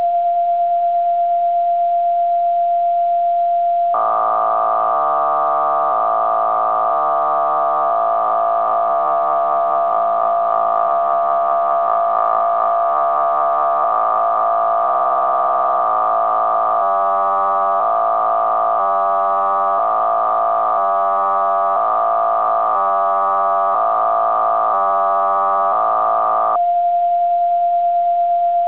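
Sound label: Russian DIPLO 2TONE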